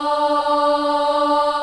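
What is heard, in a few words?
D3 child ah right, Choir; USB Soundscan vol.28; Acoustic & Synth; AKAI; CHILD AHF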